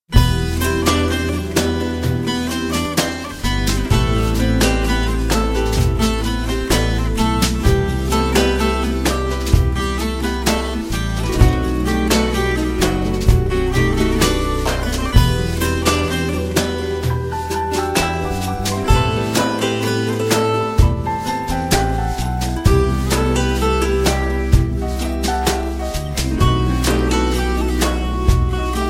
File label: blues turkish